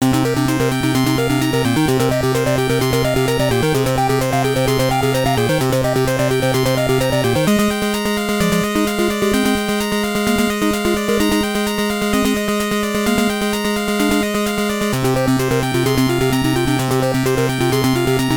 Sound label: Shoot the Targets (Bonus); cobra triangle; nes